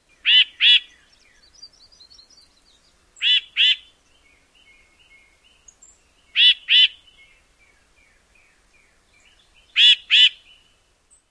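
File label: harsh doublets, Tufted Titmouse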